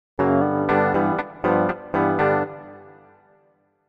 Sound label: reverb; ラージ･ルーム・リバーブ